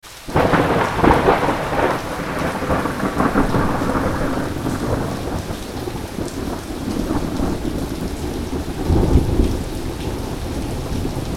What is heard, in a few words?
Rain Sound